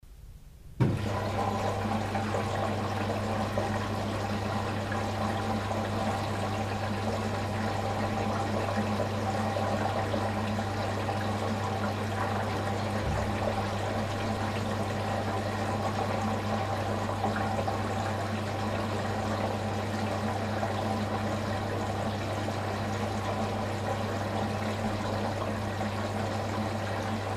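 zvuk nabora vody stir mashyny, sound of washing machine filling with water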